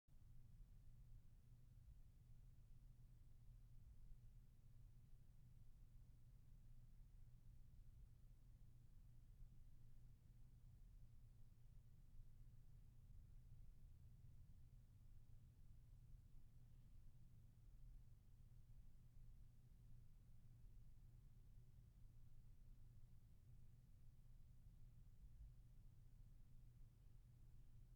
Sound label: Room Tone